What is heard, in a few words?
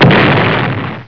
explosion; worms